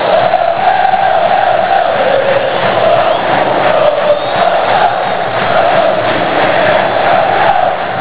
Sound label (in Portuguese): gritos; sou alvirrubro